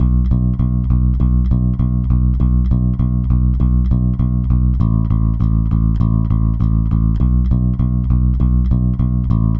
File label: WORKING MAN'S BASS